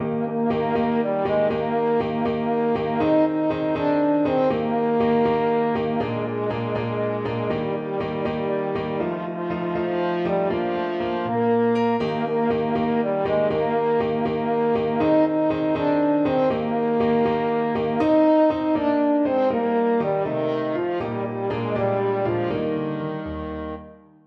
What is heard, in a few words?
over the river, french horn